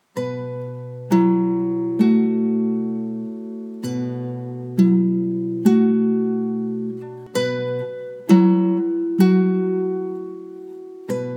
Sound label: Guitar